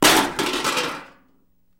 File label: Metal Debris Impact Settle